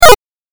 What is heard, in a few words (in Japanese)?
8bit キャンセル